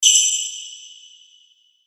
Hit Burst Metallic High Ring; Production Element Imaging Element Accent Transition; Burst Sound Effects

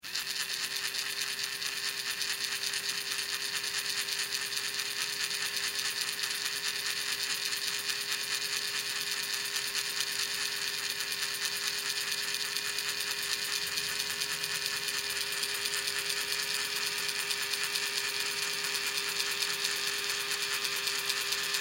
Toy Fairy Wand CU Run Long; Miscellaneous Toy; Toys; BigRoomSound

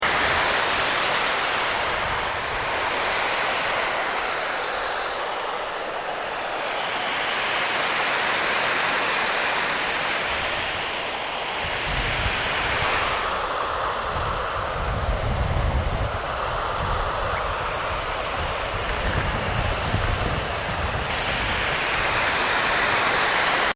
Volcanic Steam Outlet Loop, Dormant Volcano Japan; Environmental (Sound Effects:Nature); SoundEffects-Foundation4

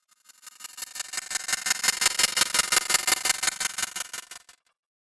Choppy Metal Sequence, metal music

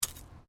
Digging Small Handheld Tool Rocks

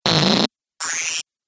2 Sfx Fat And Thin Rough Swipe, Whoosh

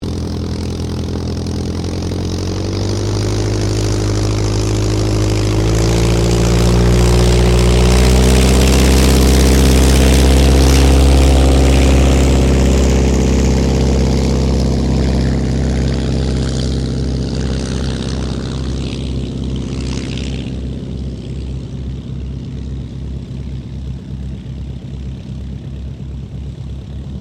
AIRCRAFT AUSTER III TAKEOFF; Airplanes; Vehicles

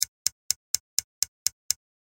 A Series Of Clicks

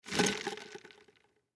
Cup Set Down, Cup Set Down Slosh Styrofoam Ice; Foley; Blastwave

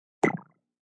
Digital Splat; Splats

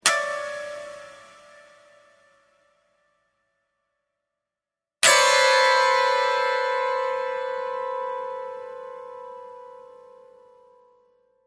Terror Strings, Terror piano strings strikes x 2.; Sound Design

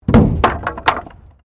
Wood Fall

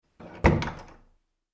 household door wooden internal shut; Door Shutting - Wooden Internal Door Closed; Miscellaneous Doors; McKinneySound

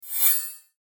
Transition Metallic Delay Reverb; Multimedia; Blastwave

